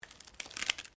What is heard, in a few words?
Branches Dead Strain